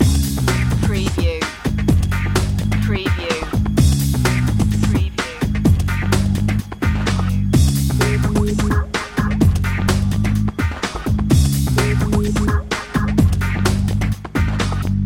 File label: funk loop